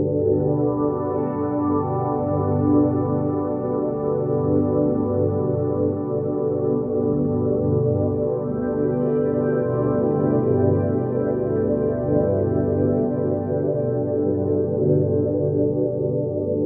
Underwater Ambient Pad

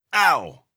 damage grunt male

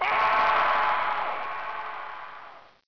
Scream

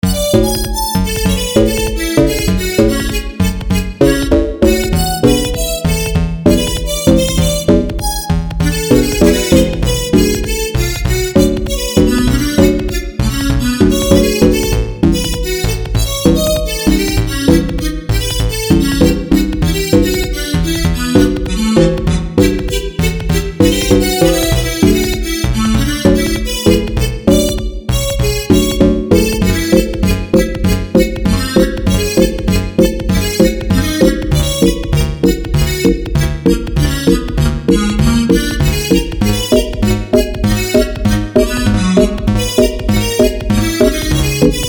Chiptune

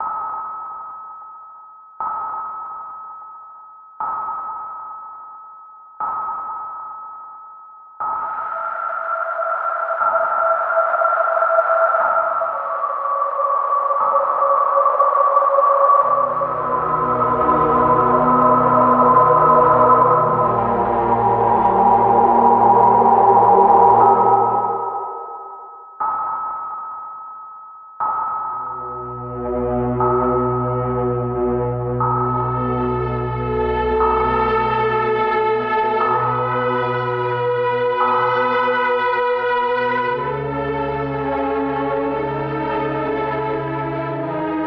Forgotten Spaceship

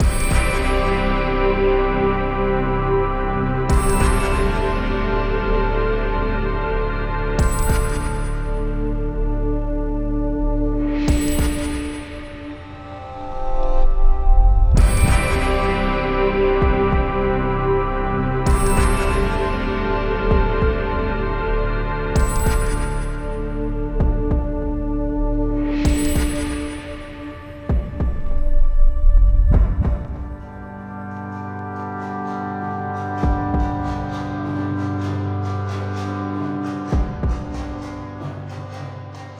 ambient soundscape

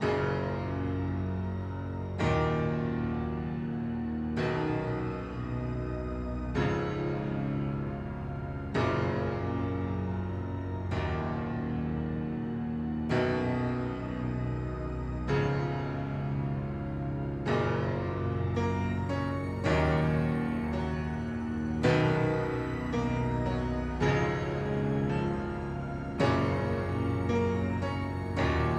Mystical Piano

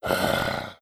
Zombie Sound

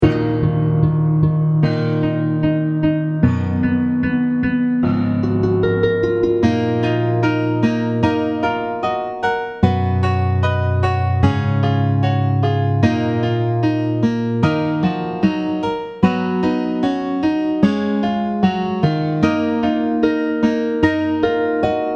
strange sanctuary acoustic

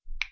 snap of the fingers

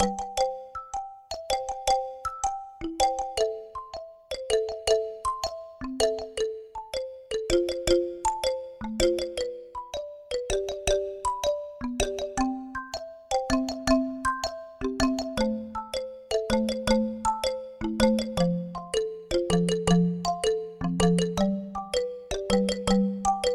band loop; monkey island

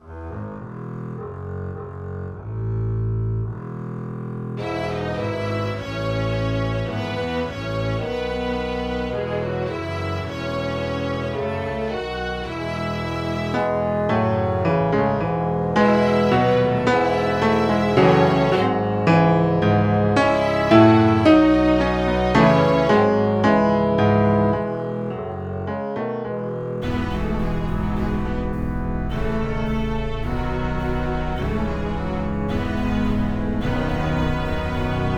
peacful town

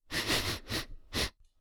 Sniffing

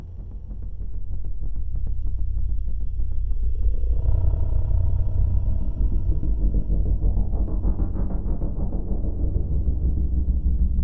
infiltrate loop

